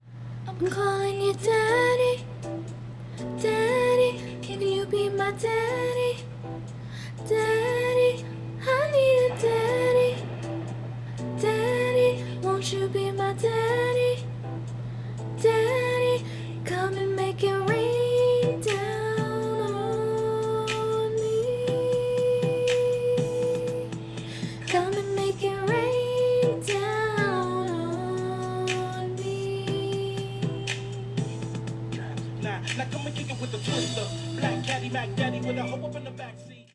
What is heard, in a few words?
Harmony, Singing